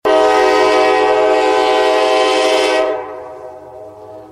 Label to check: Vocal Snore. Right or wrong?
Wrong